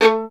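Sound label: STR violin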